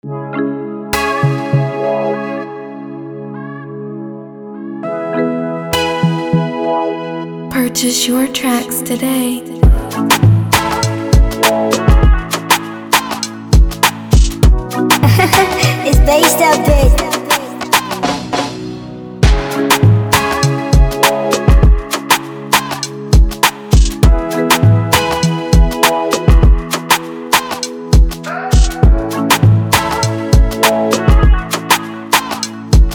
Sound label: 100bpm E major